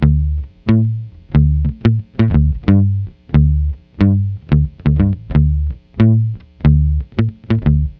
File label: Lounge Bass D; Zero-G Kocktail Kollection; Construction Kitz; Welk Lounge 090; 90 s Sample CDs